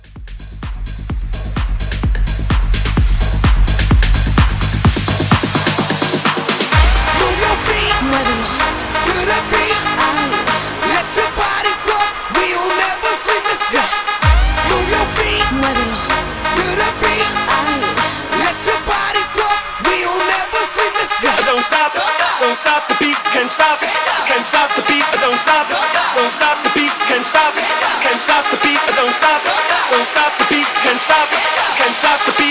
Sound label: Club House 128 CLEAN